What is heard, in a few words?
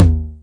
909 Lo Tom; DRUM MACHINES; 909 Extended